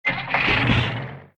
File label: KART Engine start